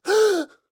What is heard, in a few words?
histeric short; donnie; Blind Drive